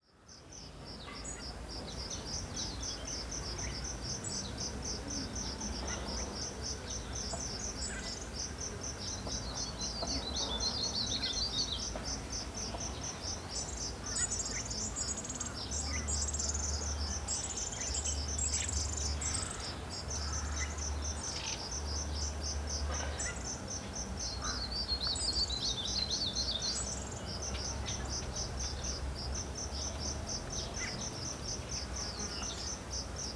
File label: Village mountain very quiet and birds, North India; Charlie Atanasyan; Faunethic